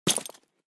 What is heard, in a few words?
Metal Grenade Impact Hard